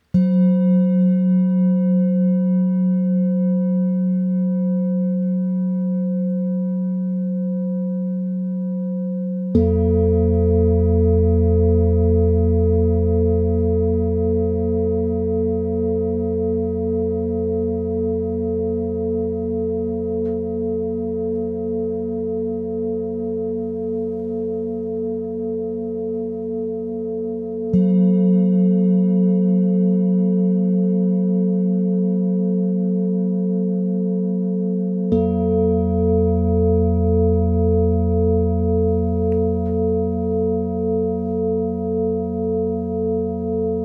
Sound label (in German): Klangmeditation; Klang-Massage-Therapie; relaxace se zvukovymi misami v ceskych budejovicich; Fachverband Klang-Massage-Therapie